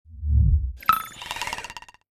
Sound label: Run; starbites